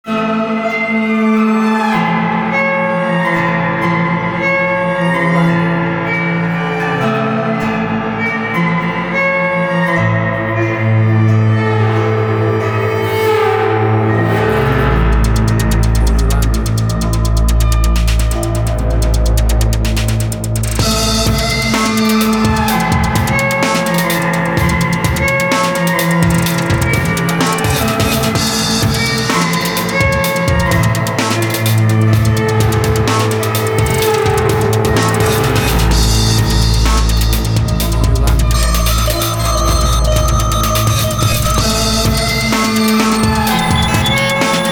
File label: Orchestra Gone Berzerk